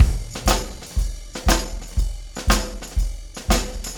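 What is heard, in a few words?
snare room ride beat, 120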